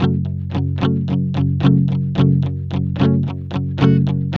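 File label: ROCK F minor 110, Vintage Blues Guitar; USB Soundscan vol.22; AKAI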